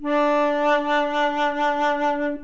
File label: flute D4 B